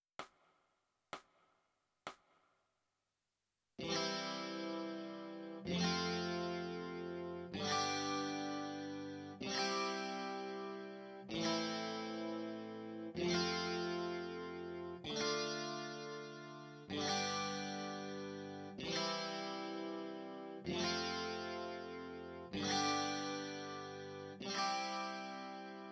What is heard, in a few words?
E Guitar